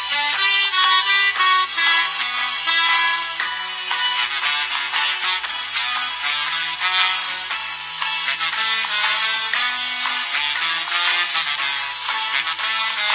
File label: sax; ss13